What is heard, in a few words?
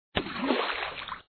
Water splash; Rig a Bridge; Tom & Jerry